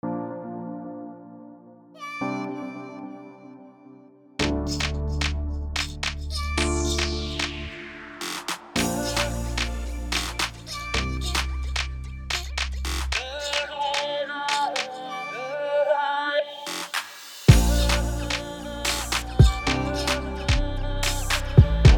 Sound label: Free Beat, Instrumental